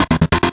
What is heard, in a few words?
WEB GTR HITS, Distortion Gtr; PlatinumPhattCD2; E-MU Producer Series Vol. 8 – Platinum Phatt